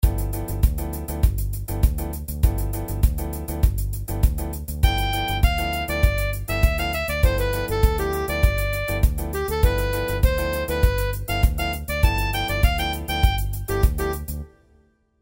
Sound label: samba